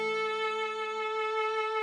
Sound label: String